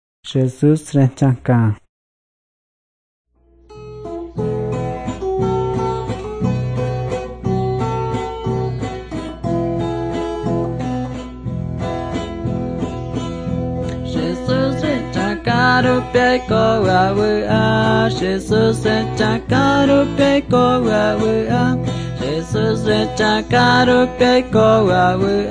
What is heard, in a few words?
songs guarani mbya; bible